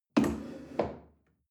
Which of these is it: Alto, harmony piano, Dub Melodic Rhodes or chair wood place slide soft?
chair wood place slide soft